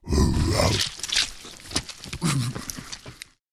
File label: zombie attack